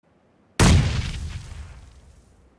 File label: Grenade sound; Pubg Message